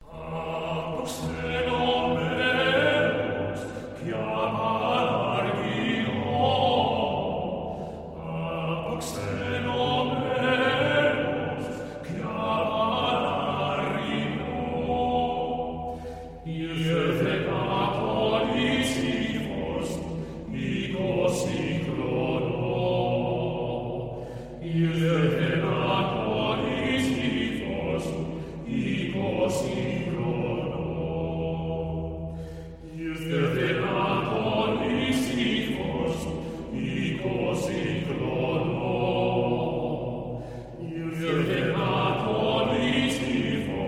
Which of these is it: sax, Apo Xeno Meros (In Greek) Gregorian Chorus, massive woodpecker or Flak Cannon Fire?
Apo Xeno Meros (In Greek) Gregorian Chorus